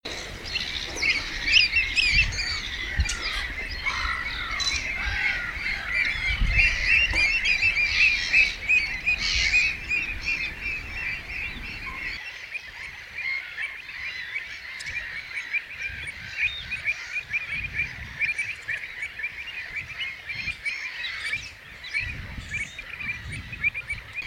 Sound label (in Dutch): kluut roep